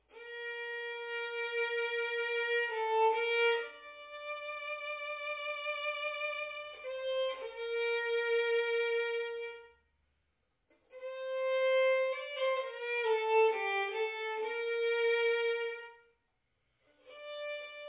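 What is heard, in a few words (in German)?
Ave Maria Schubert, violin organ; trauergeigerin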